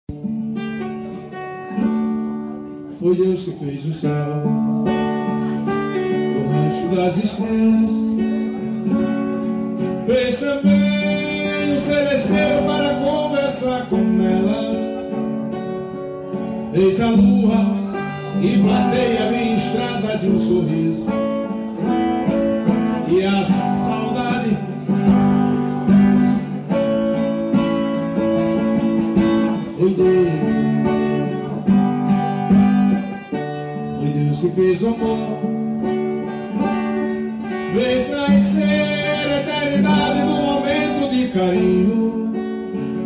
voz e violão

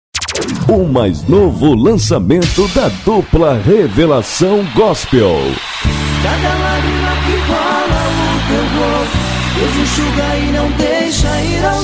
vinheta

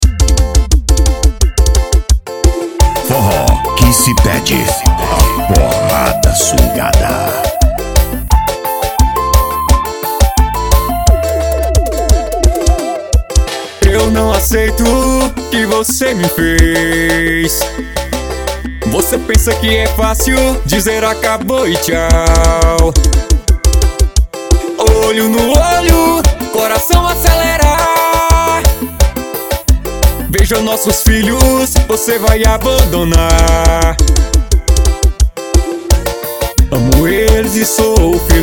coracao acelera forro

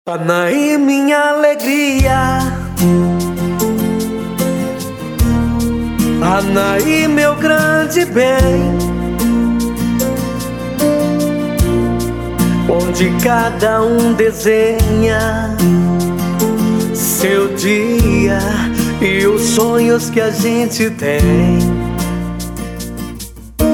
Jingles Politicos; Jingles Politicos 2020; eu vou vencer; eu quero sim; studio lako

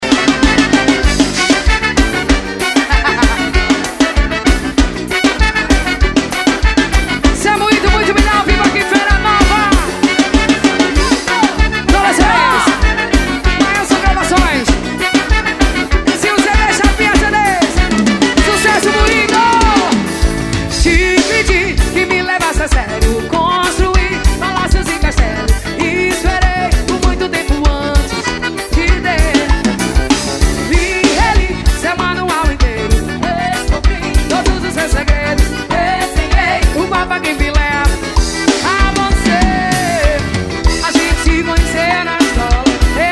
forro

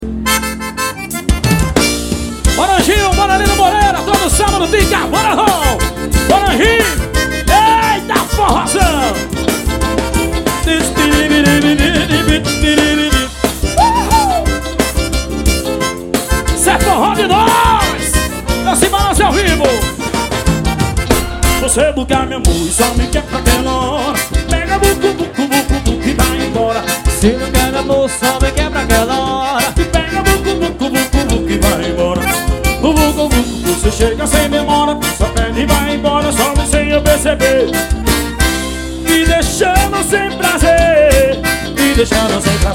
forró

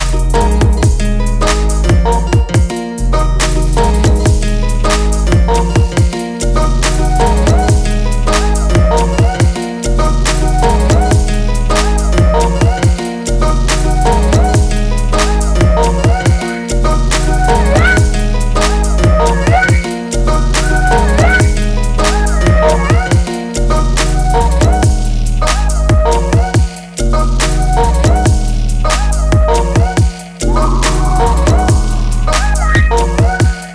beat lentidao

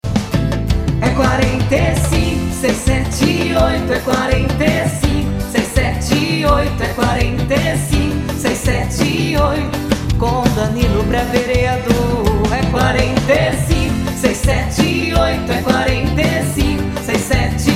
jingle

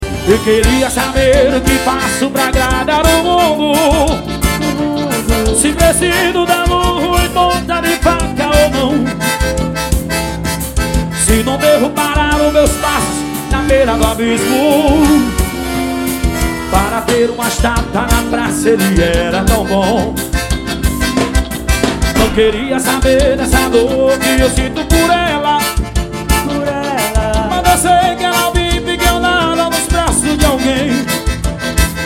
forro; manaus am

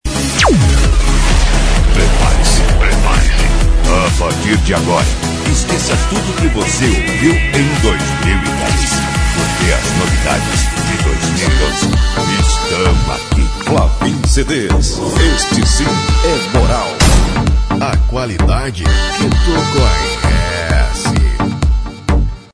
vinheta

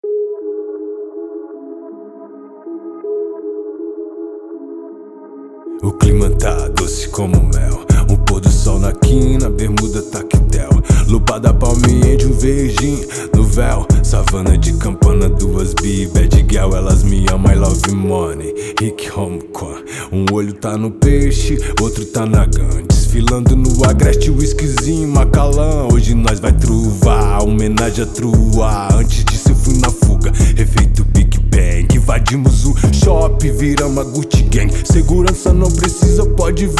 rap trap

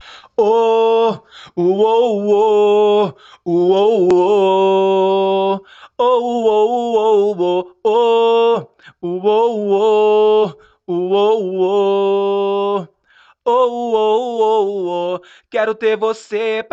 acapella funk melody pop funk romantico

